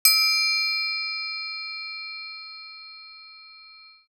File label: triangle